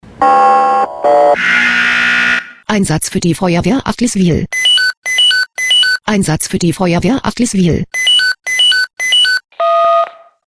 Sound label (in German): SMS Alarmierungstone; FW Adliswil